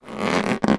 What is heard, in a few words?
Rubber suit